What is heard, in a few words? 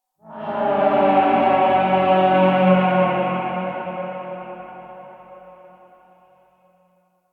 Town Distant Horn Alarm; Ambience; Duviri; Warframe